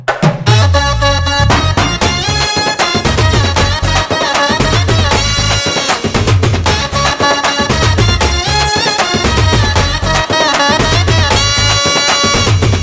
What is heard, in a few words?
Instrumental; Cameraman Gangatho Rambabu; Telugu